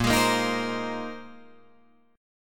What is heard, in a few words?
A sharp 9th Suspended 4th A sharp Guitar Drop D